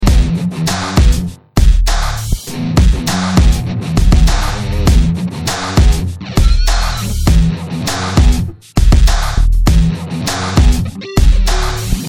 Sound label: Loop